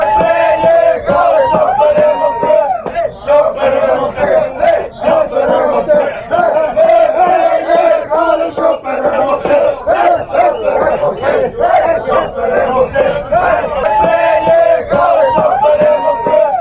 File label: Ukraine chant, fan chants